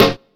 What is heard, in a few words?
perfect steel snare drum g sharp key